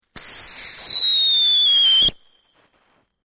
Fireworks Screamer Isolated, Domestic Fireworks

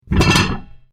Heavy Chain Impact; Chain sounds; Metal Sound Effects

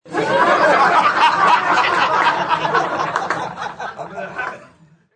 Crwd Lgh, A crowd laughs; Laughter

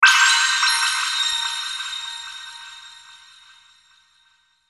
Hit Squeak Shimmer Reverb Delay; Blastwave

